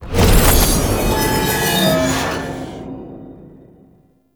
Race Gate Hit Final; Warframe; Tau; Circles Of Hell

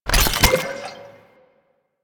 Oil Grenade Create; Warframe; Vehicles; NechroMech; Abilities

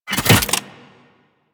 Briefcase Launcher Reload Passive C, Weapons; Warframe; Corpus

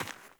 Sandals Run Rock, Footsteps

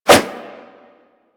Nunchaku Whoosh; Warframe; Tenno

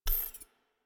UI Intrinsics Charge Stop; DE; Warframe; Railjack Player Ship

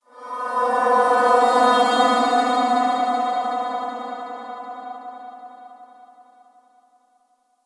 Space Exterior Dist Call, Space Battles, Ambience

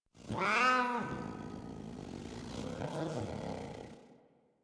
Kavat Vocal Idle; Warframe; Khora

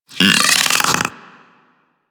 Infested Splitter Top Vocal Attack Medium; Warframe; Grey Strain

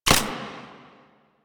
Shotgun Shot Mech; Weapons; Warframe; Pax Duviricus

